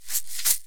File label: Salt Shake; OneShot The Pancake Episode